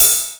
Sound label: Real Open Hats